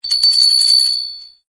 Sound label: Samsung ringtone Wind Tone